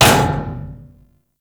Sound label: Ship Hit; RailJack Hit Effects